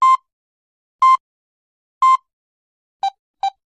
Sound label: Short Repeating Beeps